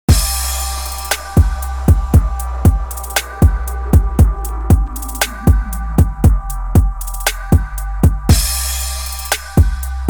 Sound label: Hooks Mainstream Rap Sung Hooks Male